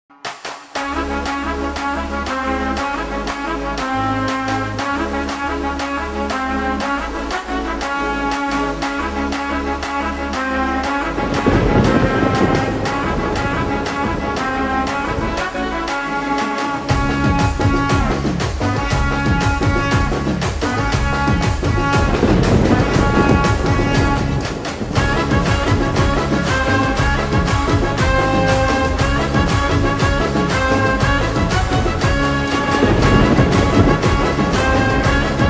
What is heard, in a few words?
Ringtone Slowed Reverb; bengali